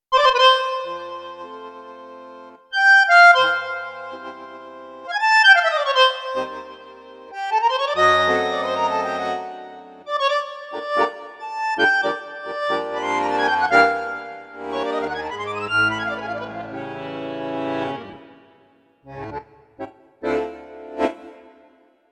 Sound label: Bandoneon, Roland V-Accordion, tango